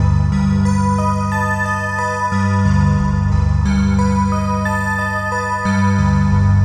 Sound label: DX Ambience; Sonic Foundry (Sony Creative Software); Numina II